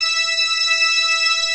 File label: PIPE ORGAN